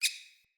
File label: Rat Squeak Single; Ambient; Vocals; Verminia Messenger Rat; Animals